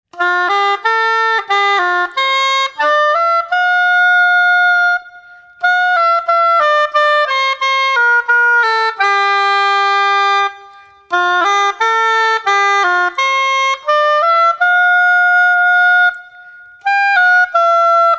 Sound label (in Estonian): oboe